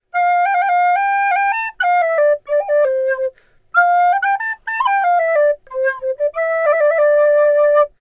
Flute Player Melody

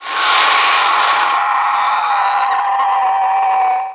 Raptor Scream

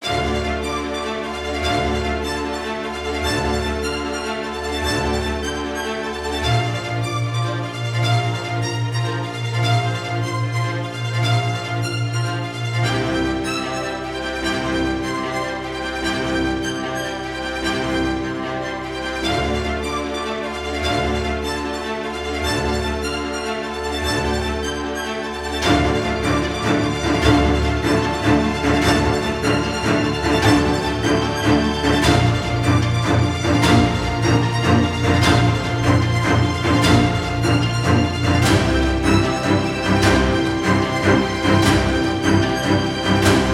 Game Victory; Poker Quest

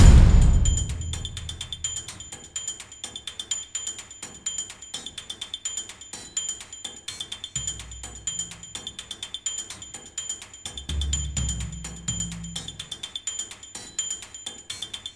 danger funny; Identity V

